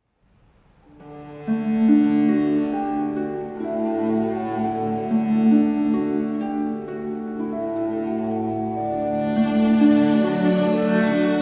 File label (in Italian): Arpa Organo Viol cell